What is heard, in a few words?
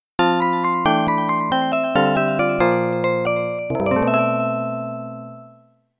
Game Over; Pang; Buster Bros; Arcade